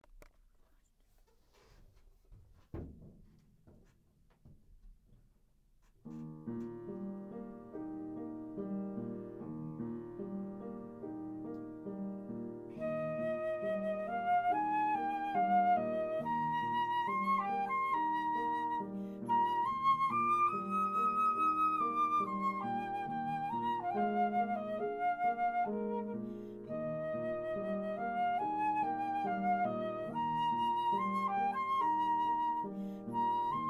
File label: flute